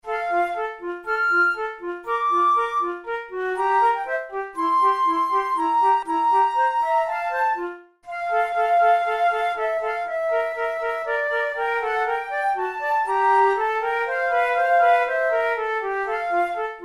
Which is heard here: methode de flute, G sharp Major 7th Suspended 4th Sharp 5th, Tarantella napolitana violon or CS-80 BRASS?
methode de flute